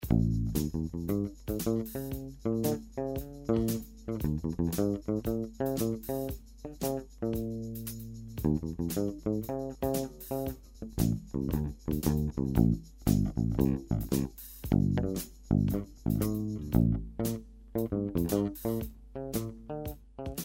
LP Triumph; 1972 Les Paul Triumph; gibson bass

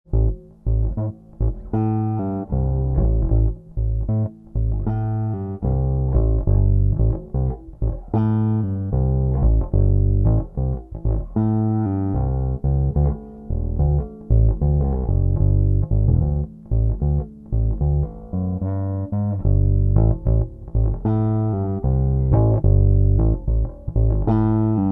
1971 SB-400 neck, gibson bass

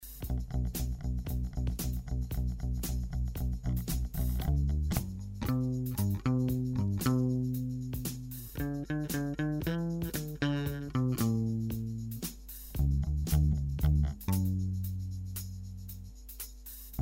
LP Triumph

